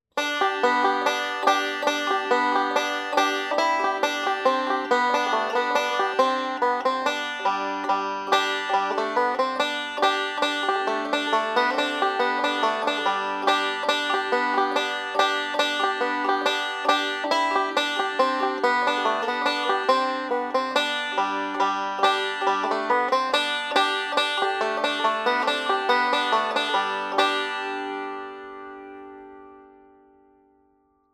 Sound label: This Old Man, banjo